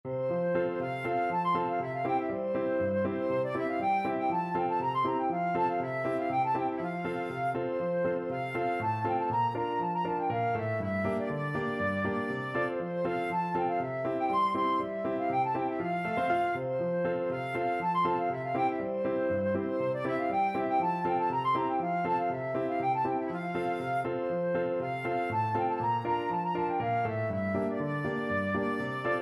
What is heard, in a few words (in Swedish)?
storpolska, flute